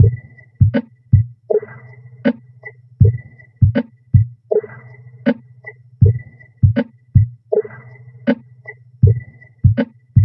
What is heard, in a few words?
Subtle Loop; Sonic Foundry (Sony Creative Software); Esoterik Beatz